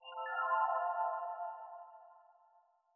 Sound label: glitch F maj; fx; bespoke - Floral v.1